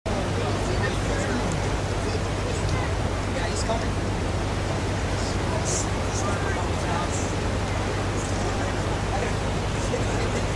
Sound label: SR-71 Blackbird, هواپیما